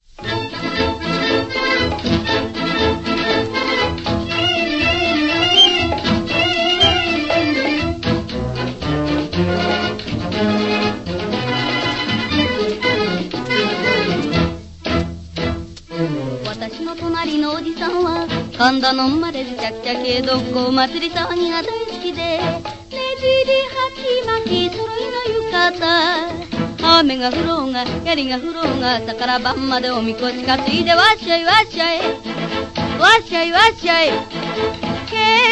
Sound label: Japanese Pops